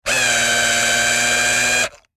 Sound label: Industry Electric Drill Tighten Screw Whine Stress Long; Drills